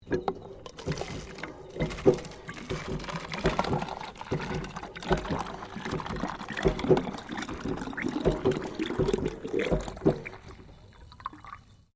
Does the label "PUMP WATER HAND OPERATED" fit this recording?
Yes